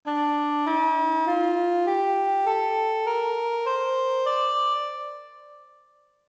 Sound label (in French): bayati, La gamme bayati; GAMMES EN MODE DE RÉ = DOUKAH; C'est une gamme très populaire en Égypte